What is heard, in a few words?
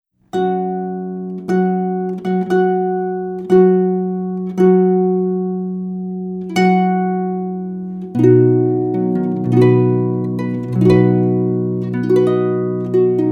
melody from the wedding march; wagner wedding march